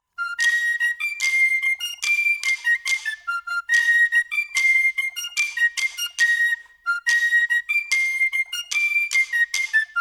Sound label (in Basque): tamborradaren diana